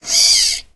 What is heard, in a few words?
binoculars zoomout